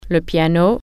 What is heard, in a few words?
piano